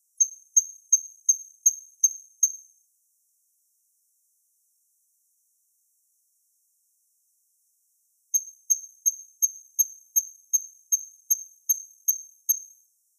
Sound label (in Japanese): kanetataki